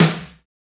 snare deep; Roland L-CD701; Snares 1; Sn Modules 1

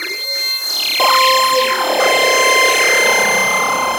Transmission Go, Synth SFX; Sonic Foundry (Sony Creative Software); The Electronic Music Manuscript Collection

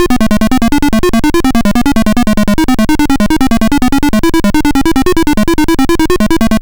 Apple II FX Thinking CompuTor, Chiptune

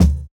Kick, jungle breaks drum sounds

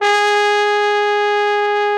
BRA TROMBO, BRA TROMBONE; Sound & Vision - Gigapack II CD 1

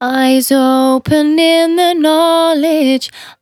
Finally 70bpm C, Velvet Vocal; Zero-G

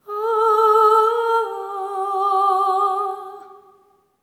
SERENE, Symphony of Voices; Spectrasonics

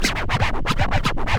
FX SCRA, Scratchin; Club-50 - Foundations Roland; Scratch 1 S

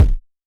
Kicks

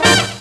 Brassy Twisty; Mo' Phatt; Digital Sound Factory Mo' Phatt

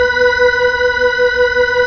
B-3, Full Xfade; Roland LCDP10 Keys of the 60s and 70s 2

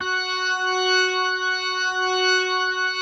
F#4S, B3, Vintage Keyboards

